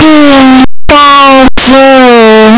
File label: pikachu cry; pokeyellow